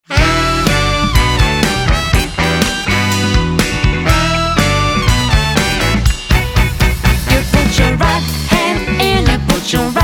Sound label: Funky